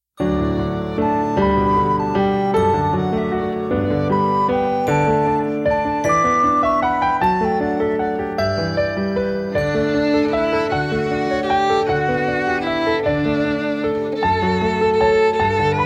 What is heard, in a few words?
Instrumental; Advent Tells Us Christ Is Near